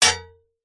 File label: sword strike metal could be shield; zapsplat